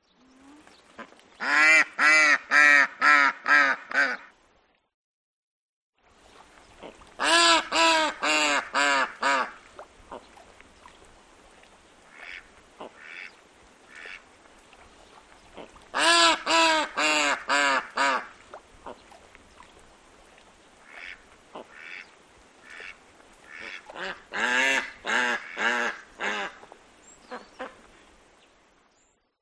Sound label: Bird Duck; audio hero